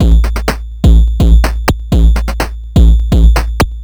Drums Strange

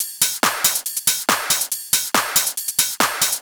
Loops 140 BPM Hihat Percussion Loops; Vengeance - Essential Clubsounds Vol.4

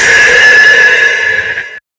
Hats, Alesis Performance Pad

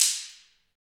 Afro Wood, Ambient Stix; Roland LCDP14 Africa VOL-2

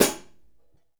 Hi Hat Closed; One Shots; Essential Percussion

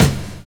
HIP SAX; Univers Sons

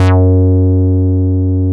SQUARE, BASSES; Masterbits; Vintage Voltage

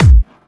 Trance Kicks

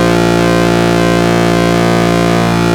ARP 2600 A#2, synthesizer; Hollywood Edge - Giorgio Moroder Rare Synthesizer Collection

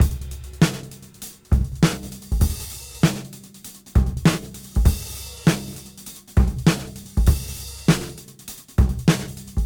Cym Beat 99 BPM; AMG - Keith LeBlanc Phat Butt Jams

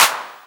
Claps; Vengeance - Essential Clubsounds Vol.5

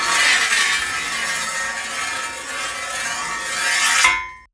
Long Metal Scrape; neuro; InsideInfo; Real World Sounds

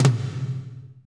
TOM, Drumscapes Roland

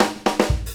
FILL

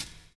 VINYL HH, HH CLSD, Drums Dance & Groove; USB Soundscan vol.11; AKAI

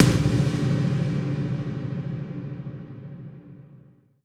Impacts, Effects

